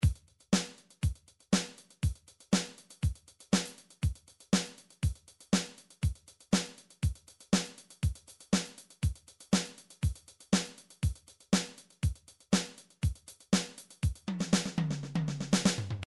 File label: midi drums